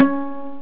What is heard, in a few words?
pluck